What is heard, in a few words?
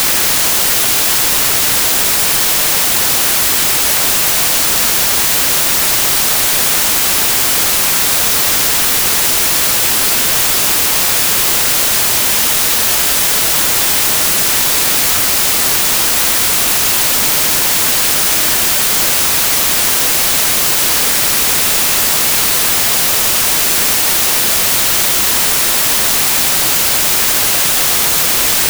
white noise playback, 1ch; enzner2008